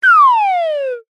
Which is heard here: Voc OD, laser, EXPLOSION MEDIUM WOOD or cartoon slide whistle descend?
cartoon slide whistle descend